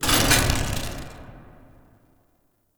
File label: Floor Panel Start; Ambience; Warframe; Entrati; Dead End Secret